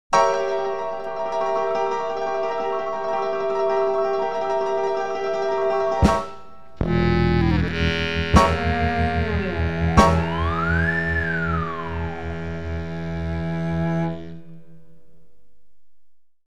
slapstick effects

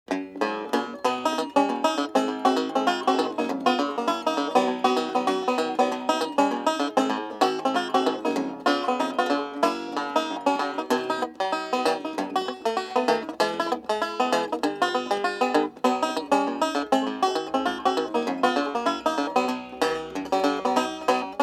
happy ragtime banjo rag; Solo Effects 51 Solo Effects 45; KPM 1276 - Blues and Ragtime

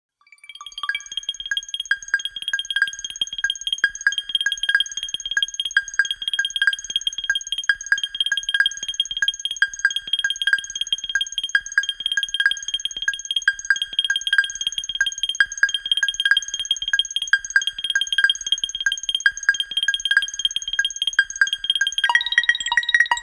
electronic effect

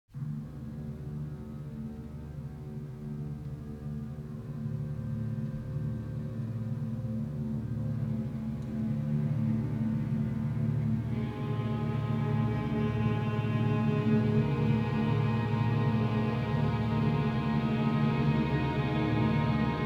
tense suspense

